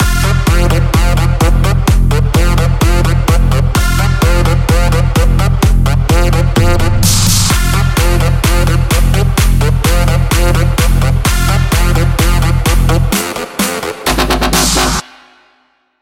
Construction Kit F Loop Full

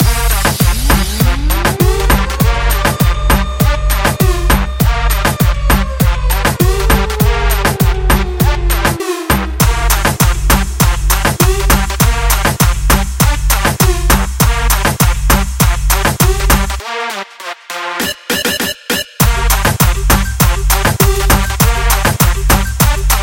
Full Loop

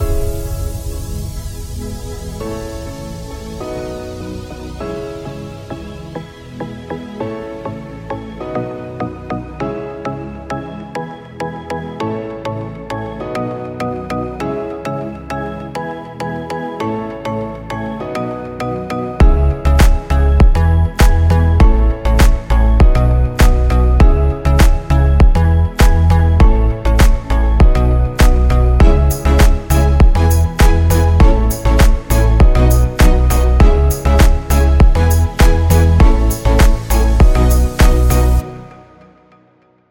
Kit Full Loop Cs

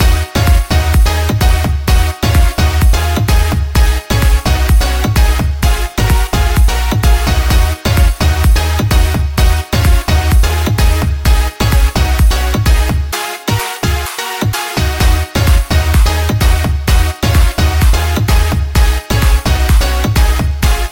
Construction Kit Ds Full Loop

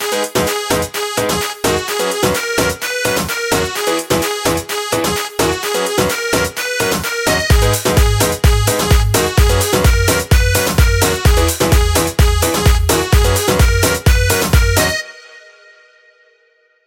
Construction Kit Gs Full Loop

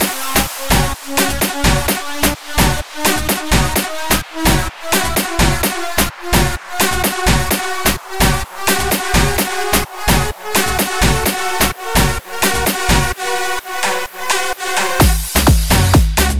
Kit Full Loop Fs

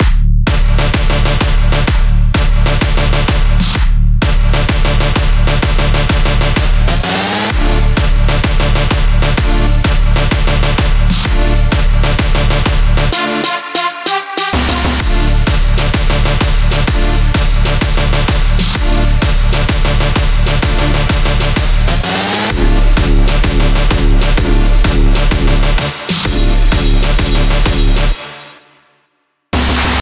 Construction Kit D Full Loop

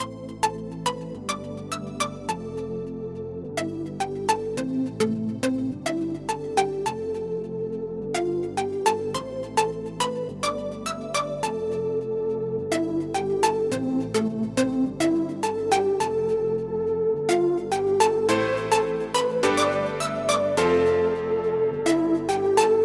Kit Loop Full

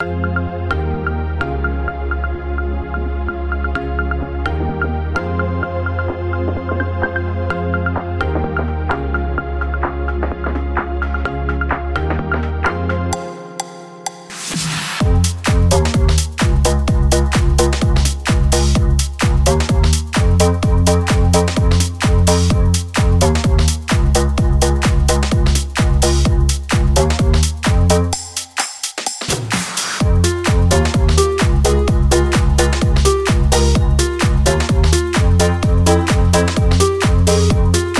Construction Kit F Full Loop

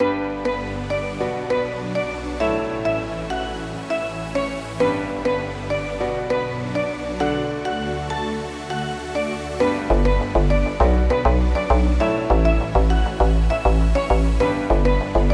Kit Full Loop G

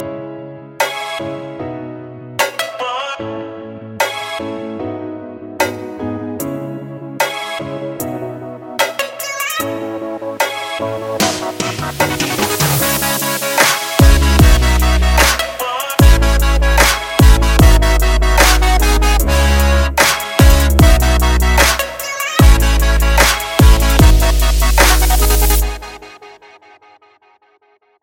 Kit Full Loop

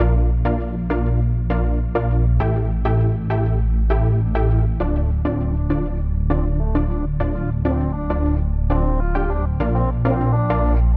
Full Loop

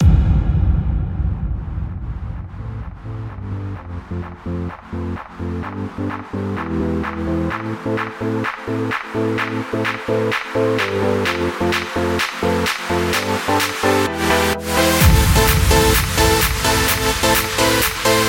Construction Kit Full Loop

